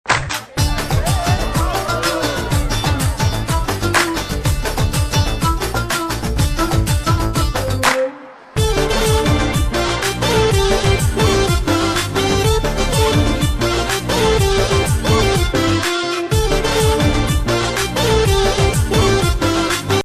BGM tamil ringtone

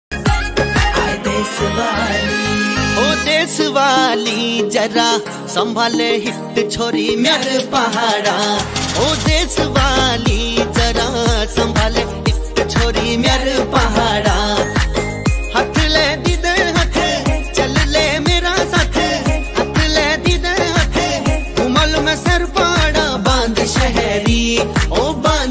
uttrakhandi ringtone

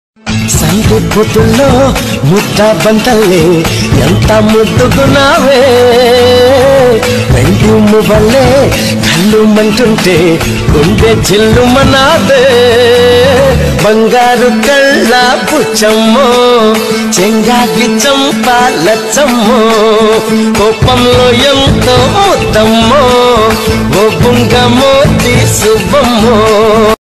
telugu ringtone

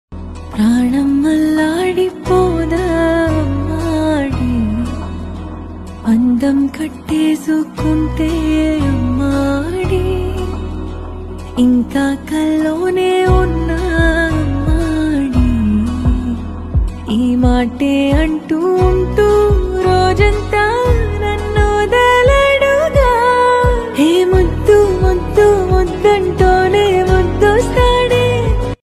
telugu ringtone

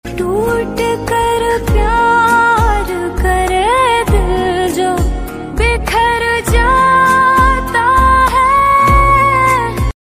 OST ringtone

